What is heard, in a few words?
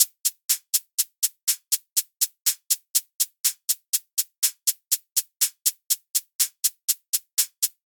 122 BPM Hat Hi Hat; Essentials; percussion essentials 1